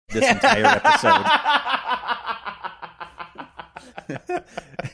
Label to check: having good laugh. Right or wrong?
Right